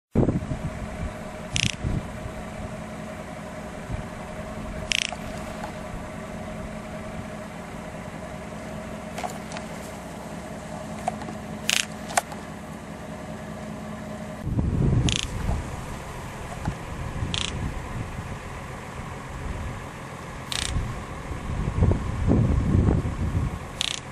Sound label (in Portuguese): Canto do papa leguas